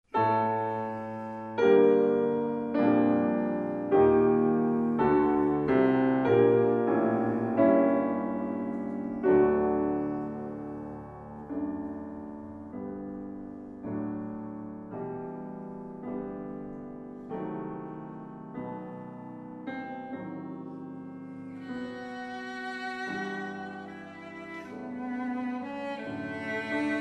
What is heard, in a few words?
cello son; pfitzner